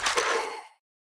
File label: snakeboss, monster